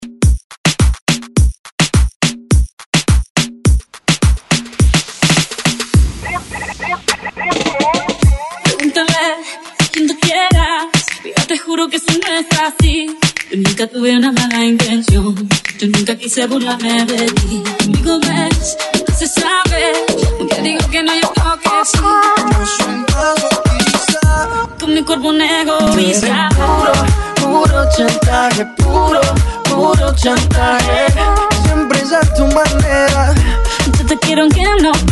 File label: Reggaeton 104 Bpm